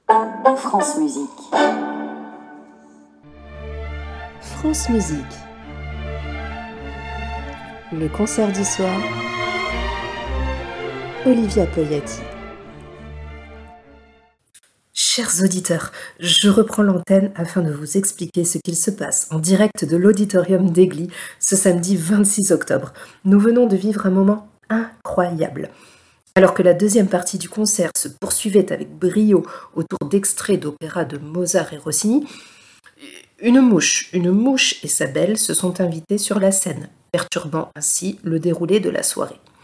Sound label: Jingle France musique; Dvorak